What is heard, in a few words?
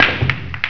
BALL; IMPACTS